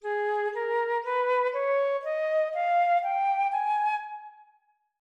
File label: mel minor Ab4